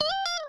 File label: wave; element-call